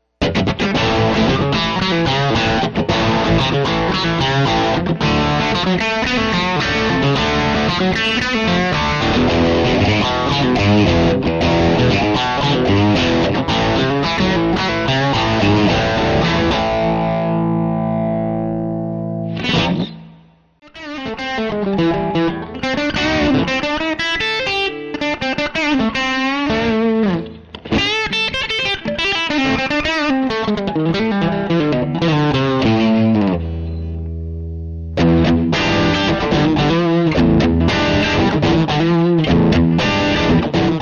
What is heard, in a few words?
tap crunch